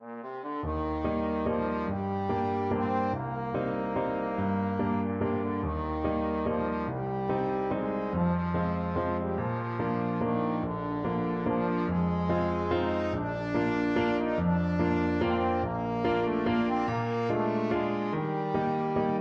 trombone

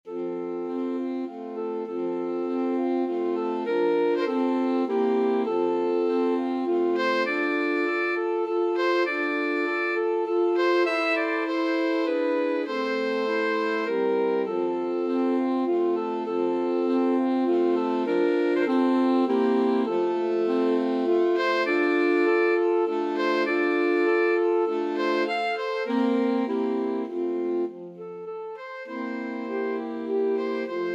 brahms waltz, alto sax quartet

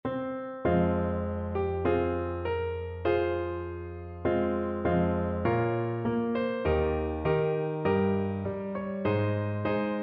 romanian national, piano

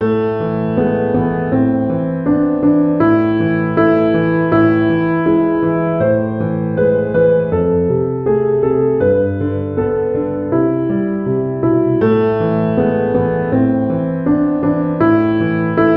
piano

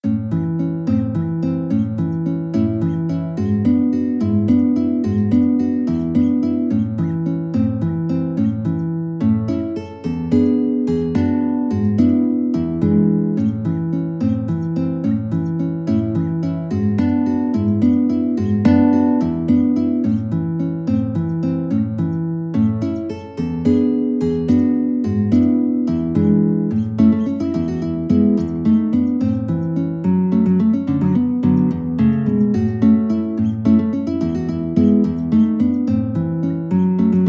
Waltz, guitar; Strauss

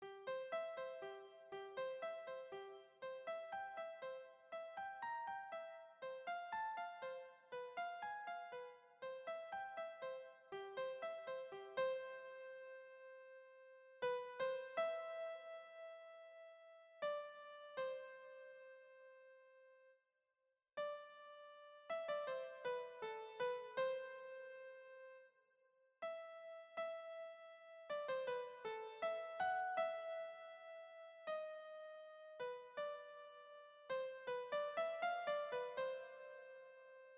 ave maria, keyboard

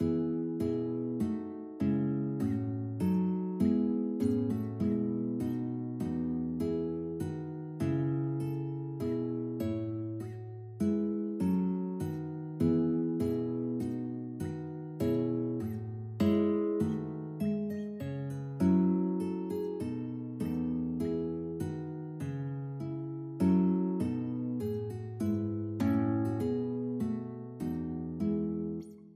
duet

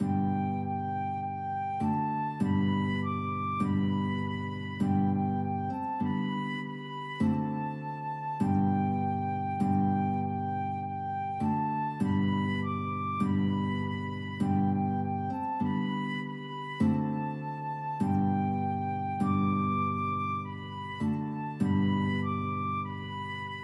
blood of jesus, flute guitar duet

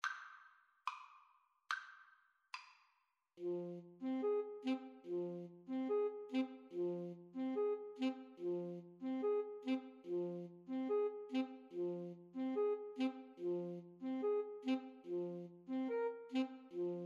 bizet habanera 2 A SAX

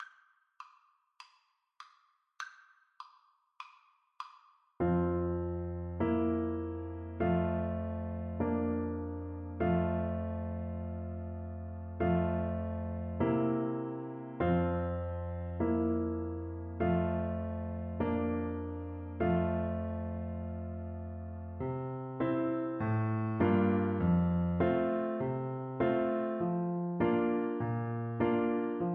recorder

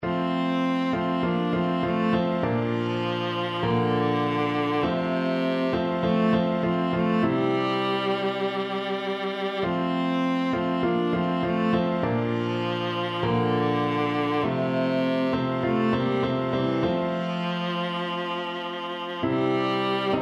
what a friend, viola

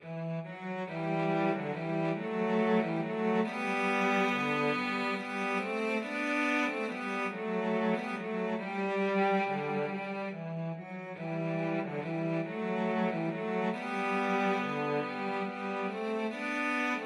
siciliana, cello duet

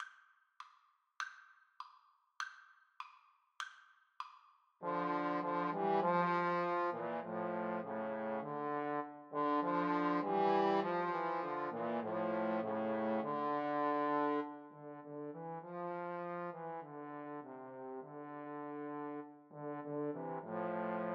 crown roses, Trombone Trio

